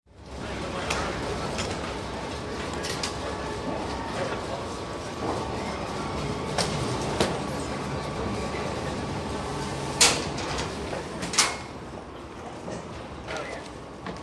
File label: Baggage claim carousel run & switch off; Terminal 4, Heathrow, London